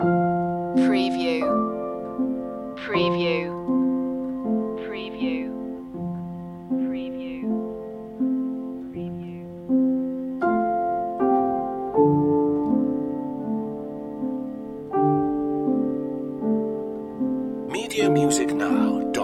summer afternoon loop

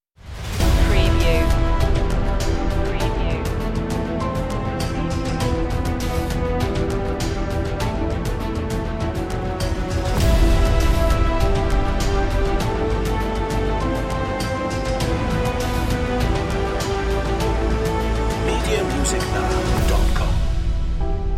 building drama

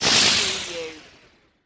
Magical Cast Flutter

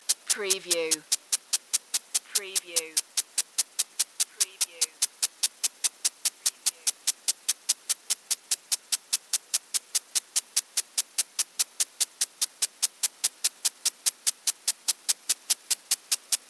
lawn sprinkler slow loop

